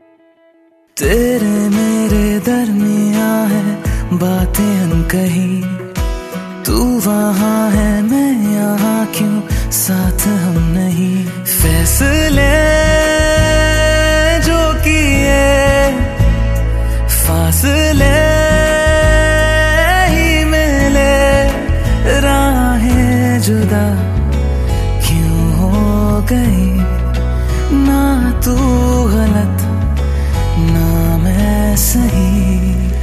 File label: Hindi Movie Song Ringtone; Chef